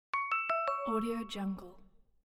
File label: sms; vb production